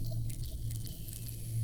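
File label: Music Dpad Evaporate 145; from dust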